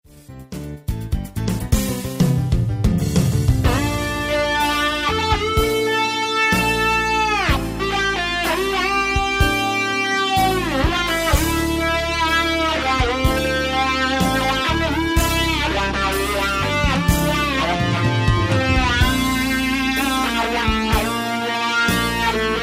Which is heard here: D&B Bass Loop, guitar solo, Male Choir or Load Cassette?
guitar solo